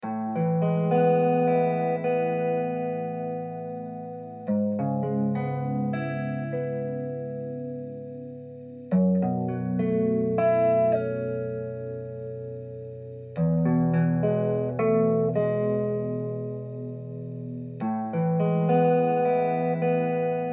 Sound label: E108 GTR chords for song